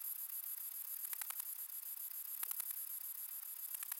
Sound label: song, katydids, neduba oblongata